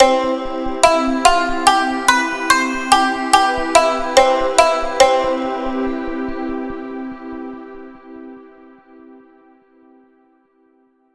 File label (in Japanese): 和ジングル